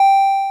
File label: elevator beep; Golden Crayon Sounds